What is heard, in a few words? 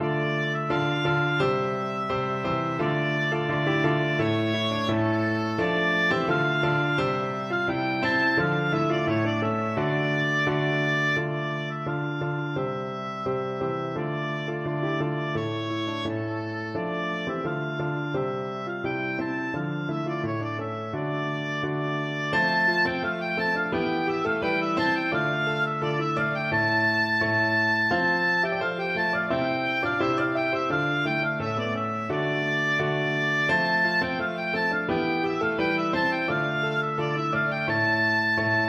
bransle torche, oboe